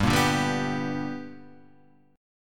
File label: B Minor Major 7th Sharp 5th